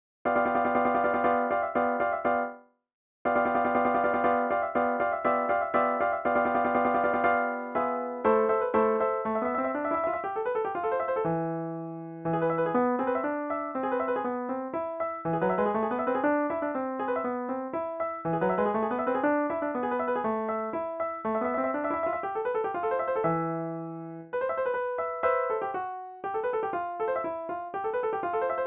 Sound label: fandango natural